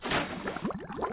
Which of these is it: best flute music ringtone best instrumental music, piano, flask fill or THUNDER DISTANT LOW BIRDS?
flask fill